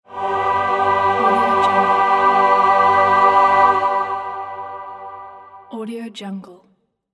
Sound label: angel choir logo